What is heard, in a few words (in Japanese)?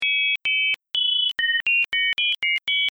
人工アカハラ; 鳥の声研究室; アカハラ VS 人工アカハラ